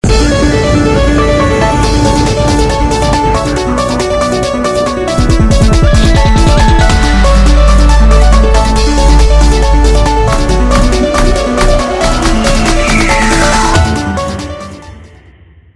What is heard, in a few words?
Nokia Ringtones